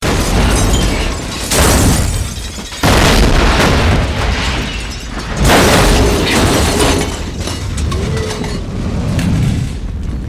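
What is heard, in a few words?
Car Explosion With Debris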